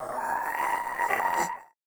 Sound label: idle, zombie; DayZ Epoch